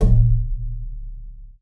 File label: BOW PERC, BASS FINGER; Xsample VOL-5